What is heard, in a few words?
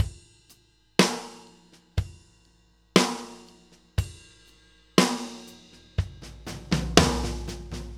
New York Loop, Drum Tools, Laidback